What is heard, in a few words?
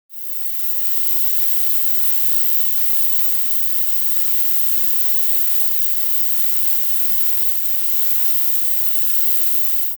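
asmr head spa wire massage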